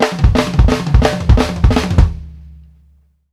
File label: ROUND IT, FILLS 108; Sampleheads - New York City Drumworks VOL-1